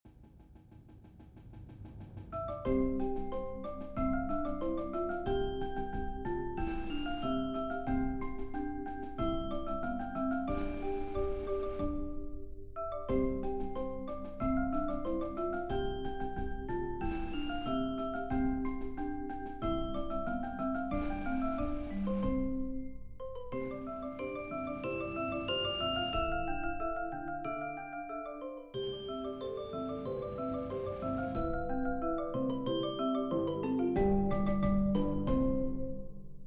Rondeau, Percussion Ensemble 8 Players, Instrumentation: Bells, Xylophone, 2 Marimbas, Vibraphone, Timpani, Snare; Concert Bass Dr; Level: Easy/Medium